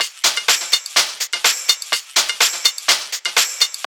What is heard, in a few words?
TRIP 90, trip loop